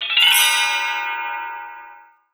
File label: Scrape, Drums One Shots Percussion; Groove Elements